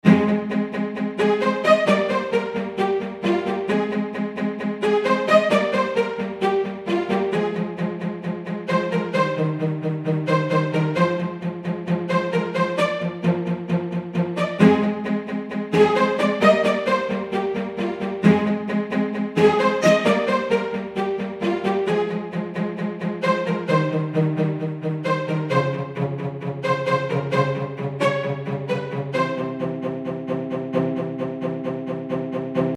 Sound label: Spiccato no Alt Bowing